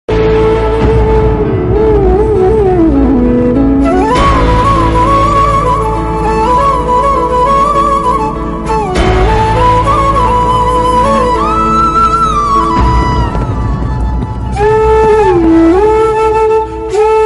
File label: flute ringtone, flute instrumental ringtone, best flute ringtone; siya ke ram